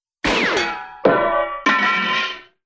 zvuk vystrela i padenie metal predmeta

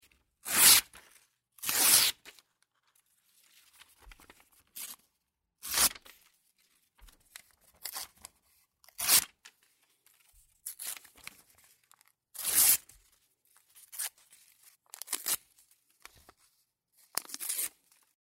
zvuk rvanoy bumagi

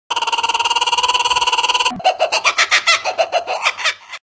kukabara

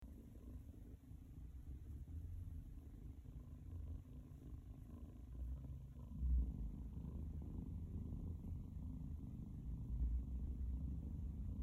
murmur kotenka

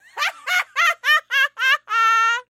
zvuk nasmeshki jenskiy; Высмеивание и издёвка над людьми

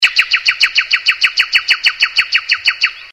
zvuk soloviya dlya cenzury

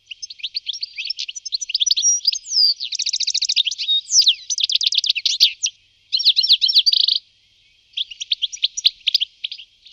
zvuk schegla

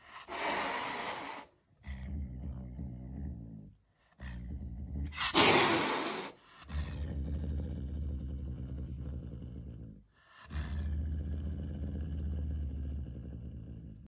karakal

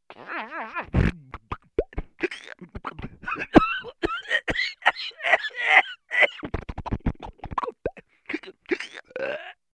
komichnyi zvuk avto cartoon

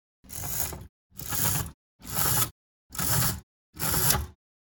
kuhonn jaliuz opusk

